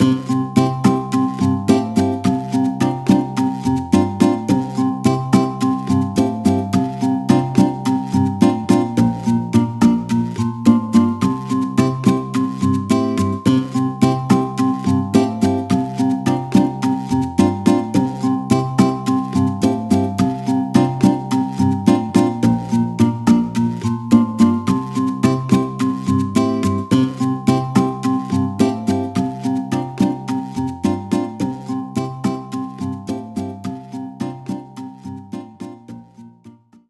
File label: Game Over